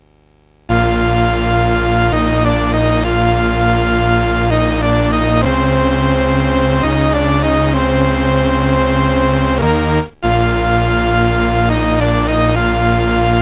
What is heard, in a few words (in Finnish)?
syntikka melodia